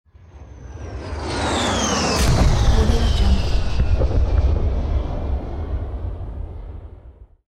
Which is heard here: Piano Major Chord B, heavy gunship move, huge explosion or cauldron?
huge explosion